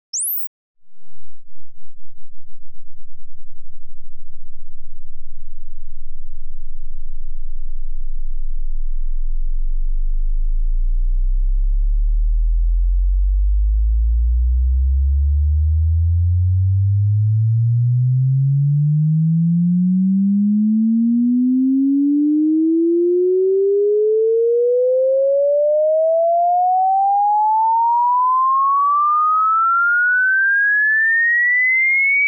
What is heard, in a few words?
Sweep 0 to 20000